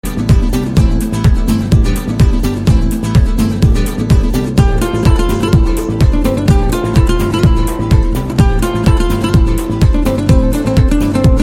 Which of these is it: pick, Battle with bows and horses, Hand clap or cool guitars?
cool guitars